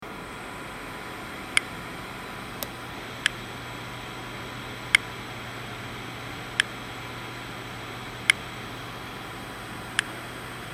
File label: Toshiba data recovery sounds